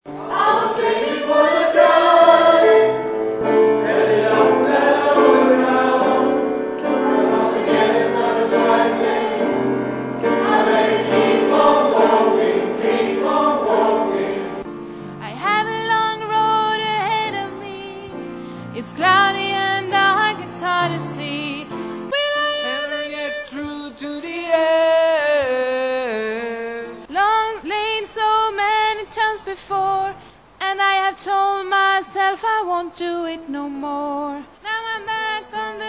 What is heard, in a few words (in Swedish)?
bas